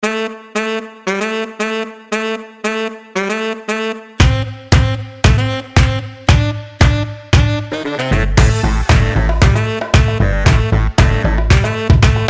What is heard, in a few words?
Real Saxes; Game Show